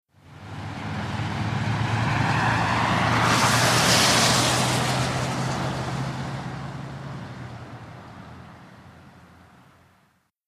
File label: Wet Traffic Wet Road